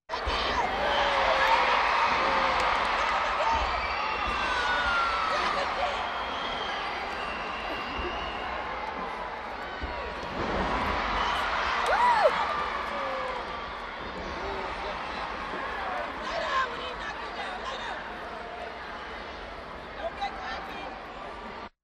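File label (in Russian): реакция толпы с возгласом одного человека оу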